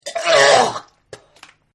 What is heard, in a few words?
Vomit; Vomiting; Human